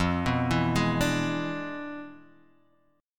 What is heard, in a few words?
E 7th F, guitar; E7/F arpeggiated; JGuitar